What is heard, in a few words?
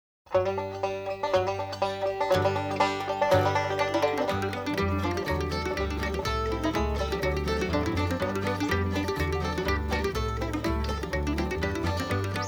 Sundays Hornpipe